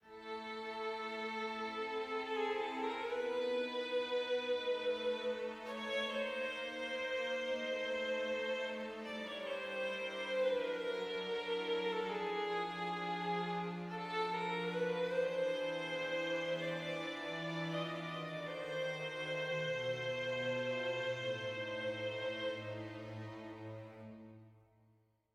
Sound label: dark med